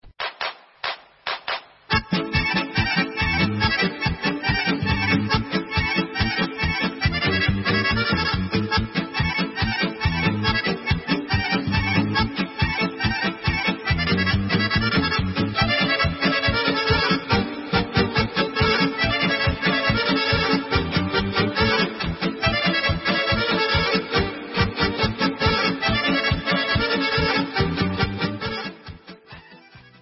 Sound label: Kolo Serbian